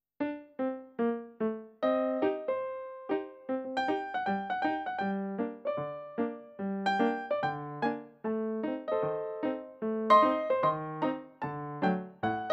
piano, Ragtime

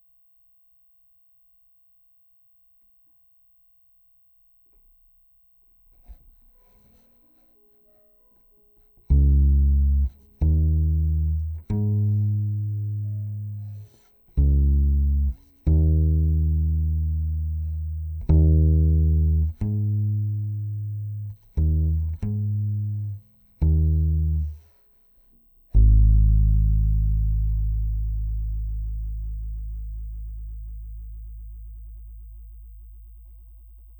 CBass DI

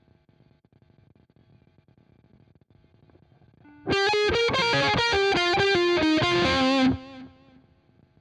Guitar

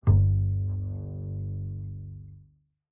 Bass, Jazz for piano

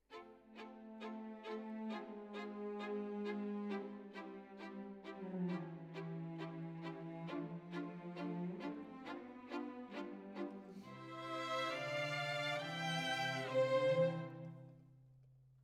Violin Solo

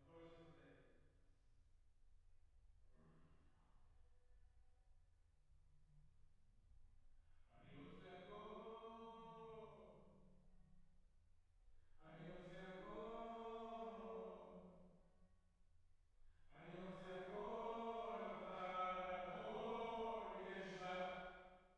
Choir

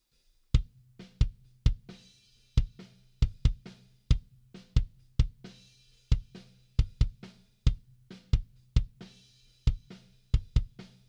kick in

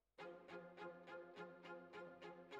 Violins, jazz for piano

